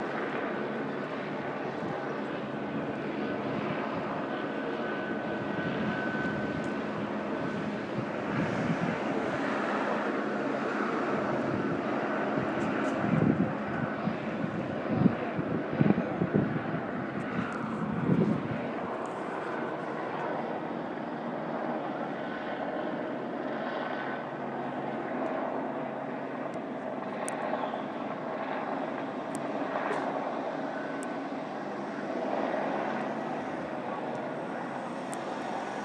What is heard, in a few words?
30 seconds of annoying tv helicopter